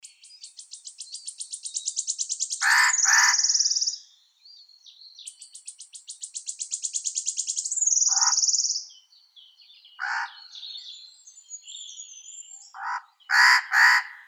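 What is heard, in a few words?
nutcracker, Nucifraga caryocatactes; kedrovka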